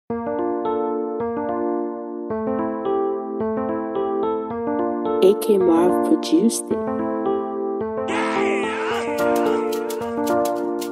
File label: Afro Beat x Zanku Instrumental